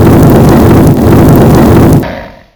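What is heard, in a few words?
Dragon Attack Skill Attack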